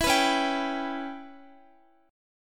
C sharp Minor 7th Flat 5th, Guitar; strummed; C#m7b5; x,x,14,16,13,14

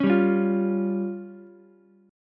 C sharp Major Flat 5th, Ukulele, C#Mb5 chord; C#Mb5 strummed; JGuitar

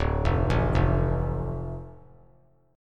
D Minor D, chord; Dm arpeggiated; JGuitar

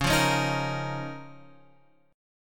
C sharp 6th Add 9th, Guitar, Open F; strummed; x,8,5,8,6,5

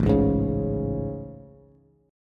A Major 7th A, Bass; AM7; strummed; JGuitar

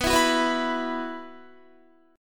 B Suspended 4th, Guitar; Bsus4; strummed

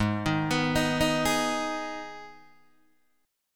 G sharp Minor G sharp down Guitar Open D, chord

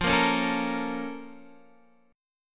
Bb Minor Major 7th

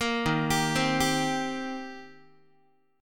Eb 6th Eb down Guitar; Eb6 arpeggiated; JGuitar

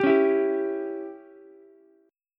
C sharp Diminished C sharp, Ukulele; strummed; chord; JGuitar

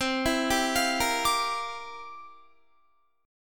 C 11th C down Guitar; arpeggiated

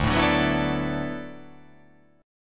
D 6th Add 9th D

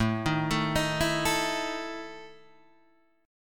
E Major 7th Suspended 4th, A

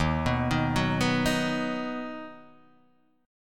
Eb Minor Sharp 5th Guitar Half Step Down

